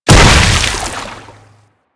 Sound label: Hammer Impact Water B; Warframe; Weapons